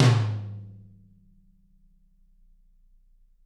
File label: TT, Toms; Bob Clearmountain Drums I